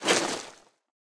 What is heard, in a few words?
chain rattle; jewels